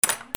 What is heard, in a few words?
DOORS